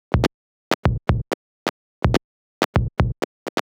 KIT PERCUSSION

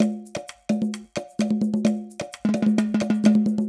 Loop Percussion 130

